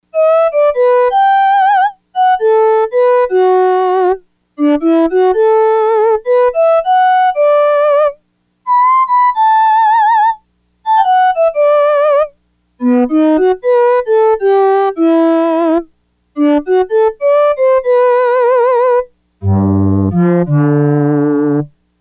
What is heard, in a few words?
Synvox, Yamaha DX100; Factory Patches